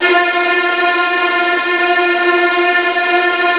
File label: STR TREMOLO, Strings